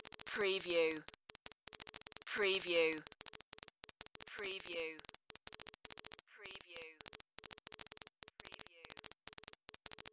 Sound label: Teletype Readout Beeps